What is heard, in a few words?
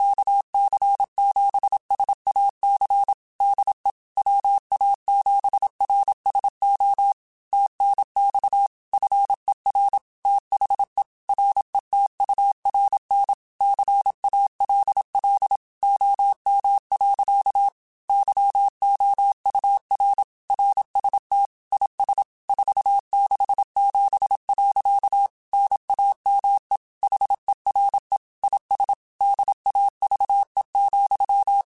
Morse Code, QSOs